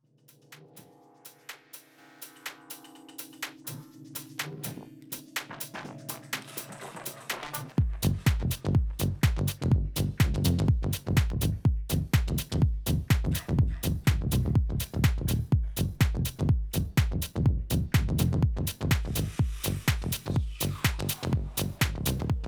minimal sweep and live setting

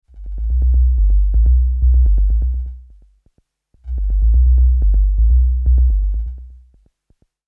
Malekko Manther Sub VCO Noise Test

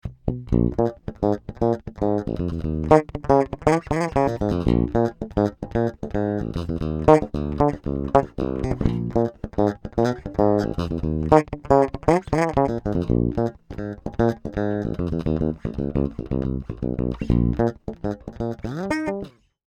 SpaceBass active Finger BridgePU

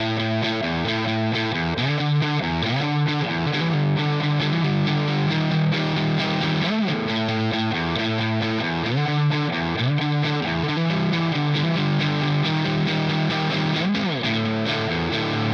Germanium Fuzz, Vintage Pickups, Gain; Benson

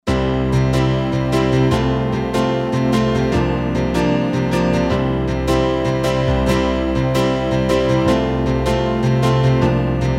roland rubix44 line in ohne

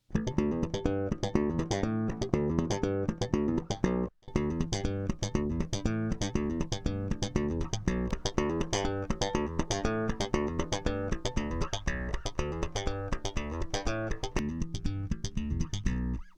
aural enhancer

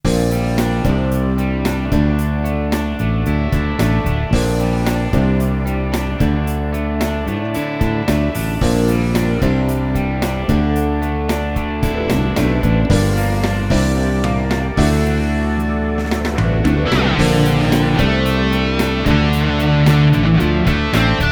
rock

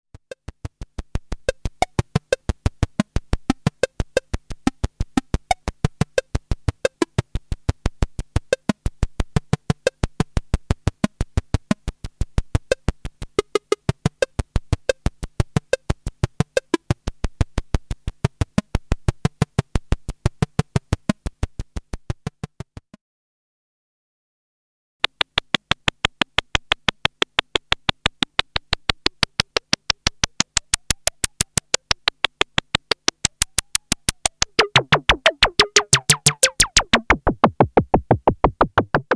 EMS Logik

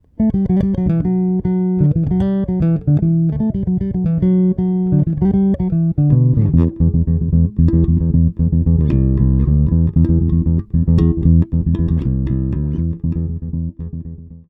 Bridge bass mid boost

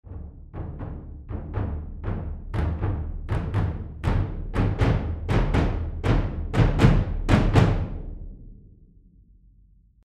Gran Cass Ensemble; Cerberus

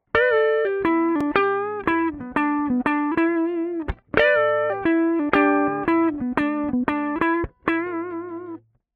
Hi-Z clean; RME

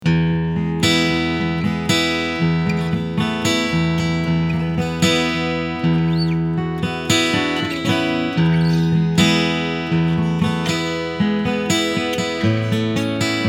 Strumming Mic

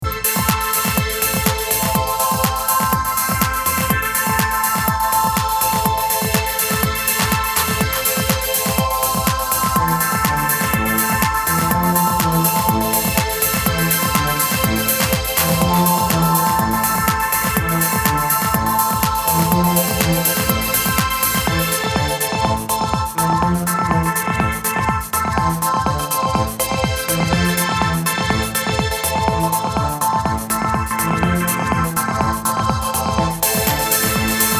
Behringer Solina Sidechain

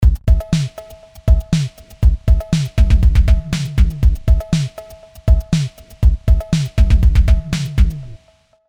CMI V Beats FILTER MS 20 Drums Rave Room

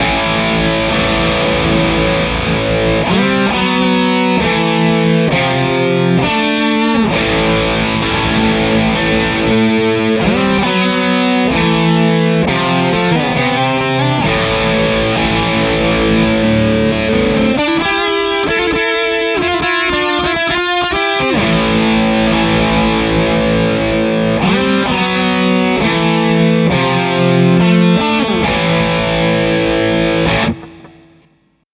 Ibanez RG Gain Neck Position

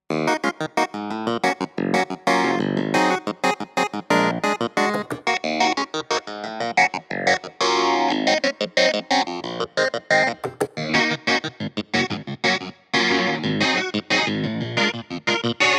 Montage

